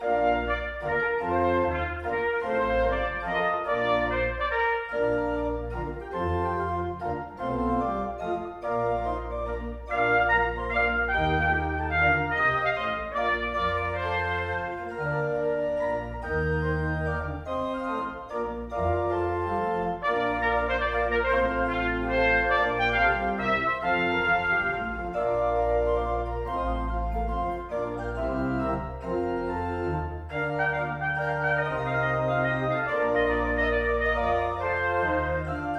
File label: Trumpet Voluntary; John Travers